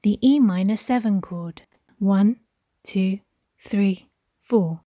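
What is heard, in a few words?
E minor 7 chord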